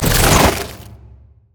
plant enrage; Zyra; LoL

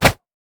smokebomb; LoL; akali

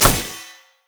Shen ki attack; LoL; TPAShen

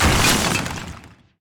shatter; lissandra skin01

